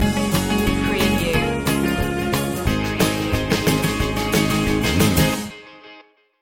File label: back home stinger